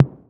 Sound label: 808 SOFT; Wizoo - Platinum 24 Electronic